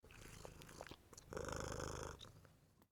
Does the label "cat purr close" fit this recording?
Yes